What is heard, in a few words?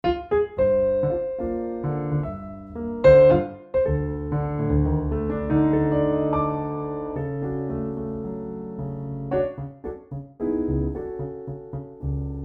CFX Perf, garritan cfx concert grand; garritan cfx concert grand aria player presets